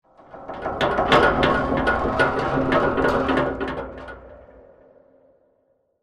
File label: metal stress med; Titanfall 2